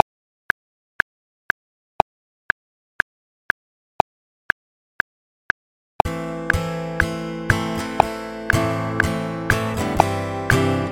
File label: Baerendans backing